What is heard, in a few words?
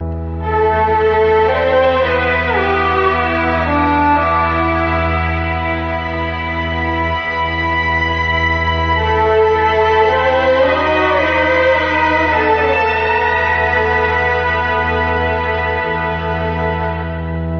strings, Sad